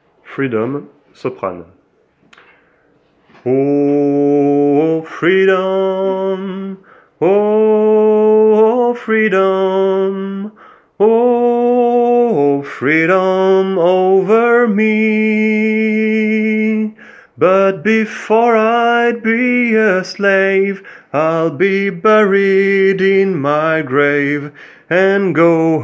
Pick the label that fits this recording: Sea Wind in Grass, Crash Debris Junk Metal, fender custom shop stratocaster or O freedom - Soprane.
O freedom - Soprane